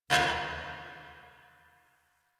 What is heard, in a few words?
Parking Lot Metal Door Distant; Warframe; Ambience; Nineteen Ninety Nine